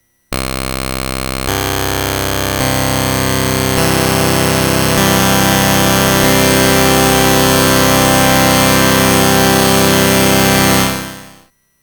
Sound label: SID MOS6581 TRI SQR 6 Oscillators